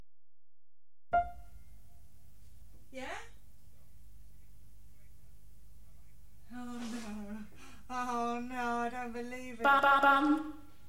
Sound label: Beat Box Diversion One